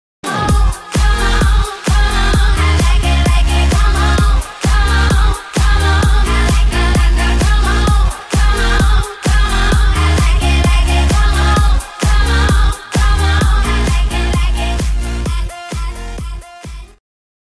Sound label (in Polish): klubowe zm